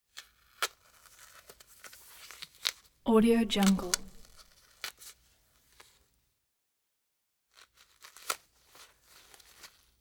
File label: bandage peel; prosoundfx